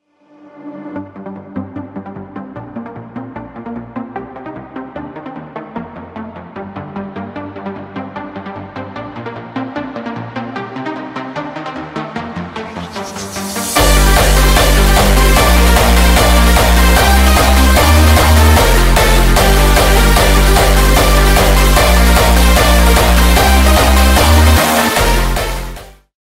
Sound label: lullaby melody